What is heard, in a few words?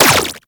Laser Fire; Warframe; Corpus